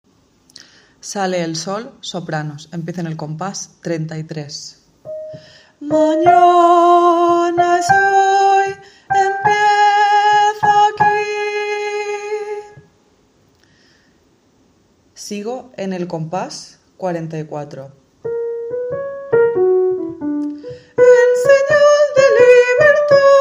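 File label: soprano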